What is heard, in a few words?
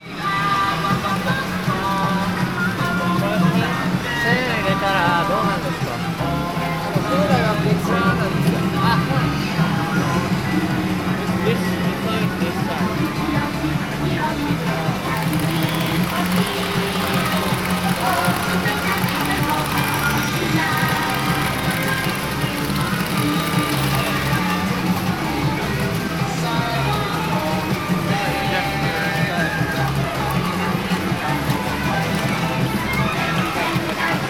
pachinko, tokyo